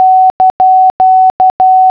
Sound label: parenth, morse; The Numbers Racket CD-ROM